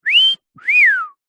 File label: human whistle mouth short wolf whistle; zapsplat